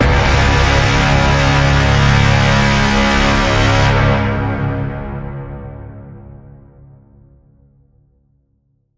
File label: Bend, C sharp